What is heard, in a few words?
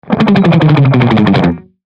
instruments guitar; maoudamashii